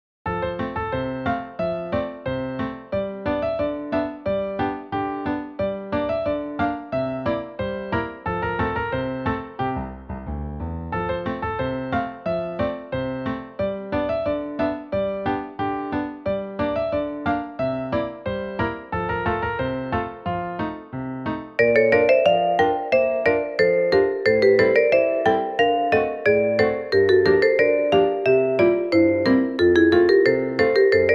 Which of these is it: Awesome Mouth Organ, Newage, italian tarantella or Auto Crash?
Newage